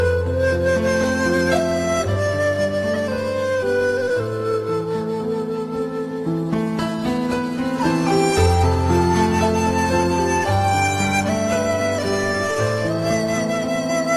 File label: chinese flute and piano